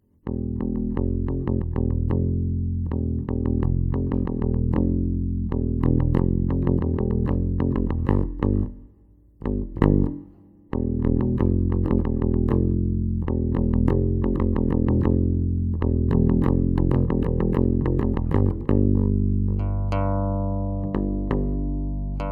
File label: bass run